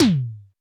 Roland U220 Electric Set, Drum Machines

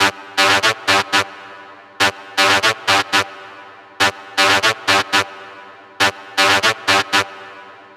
Techno Synth; Sonic Foundry (Sony Creative Software); Trance NRG VOL-2; Techno Synths

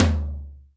HR16 TOM; Crypton - DrumBase5000

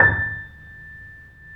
A, The Piano; InVision Interactive - The Piano; BOESINGSOFT

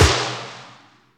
FX snare; E-MU Formula 4000 Series Vol. 2; OrbitPercMaster

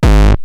Roland TR-909 BD; BD Selection

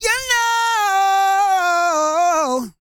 REGGAE, Vocal Planet World Voices; ILIO; Vocal Planet VOL-5

